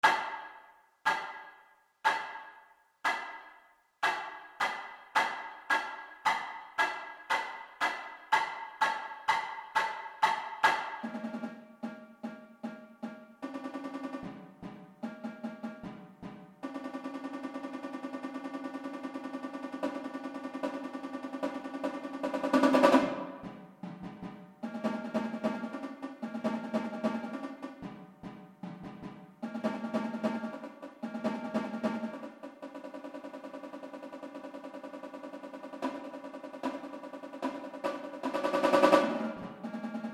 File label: paint drums